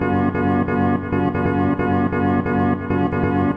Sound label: undulating lightgrid chord; SYNTH AND ELECTRONIC LOOPS